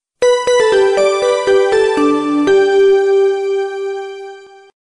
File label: carillon rapide